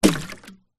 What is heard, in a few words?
household watering can or container empty small plastic throw land into deep water